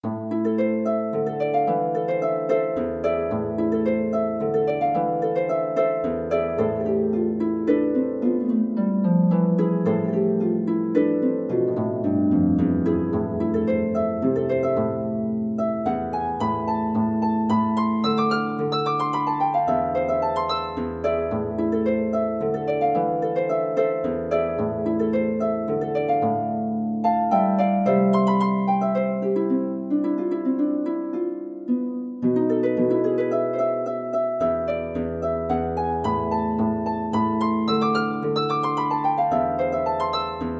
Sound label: bolero, harp